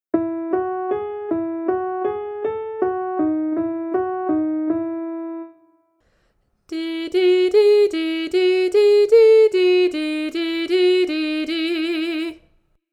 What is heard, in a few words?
vocal exercises